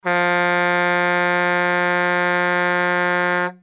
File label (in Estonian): auto signaal